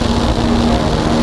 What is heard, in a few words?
Projectile Flight Loop; Weapons